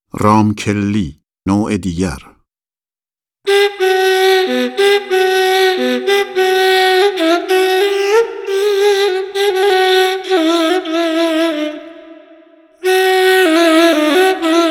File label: Segah